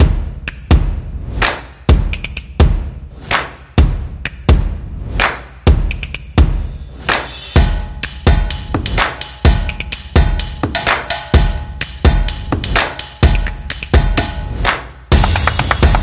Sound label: Clapping Cool Drums; calm drums